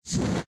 mech armor land; Factorio

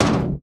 slide stop, cryogenic plant; Factorio

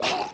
wriggler death, enemies; Factorio

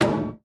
slide stop, electromagnetic plant; Factorio

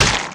mining; cuttlepop; Factorio

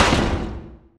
asteroid damage metallic small; Factorio

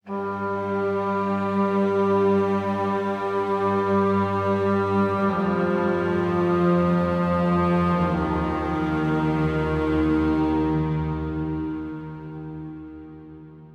space ambient; Factorio - Space Age

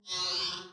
insects deep mud; factorio

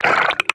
wriggler idle, enemies; Factorio

